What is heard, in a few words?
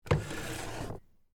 Foley, Drawer, Open, Antique, Wood, Dresser, Small; Drawers